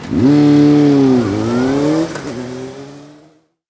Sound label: Fast Motorbike Drive Away